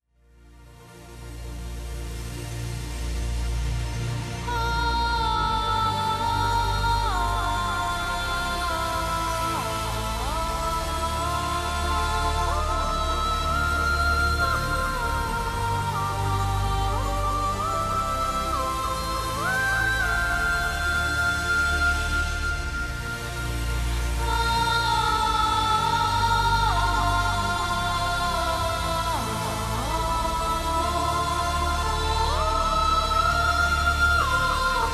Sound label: distant light